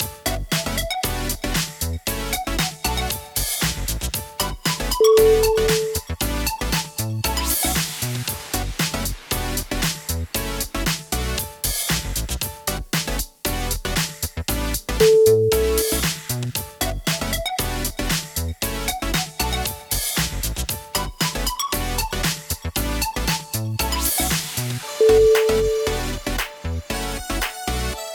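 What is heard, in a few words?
autofunk, music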